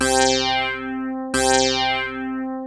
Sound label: pulse